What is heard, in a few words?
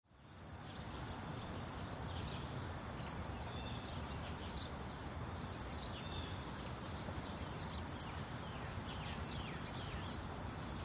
Ambience Subdivision Day Birds Insects Neighborhood; Outdoors